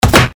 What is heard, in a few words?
fist slap hit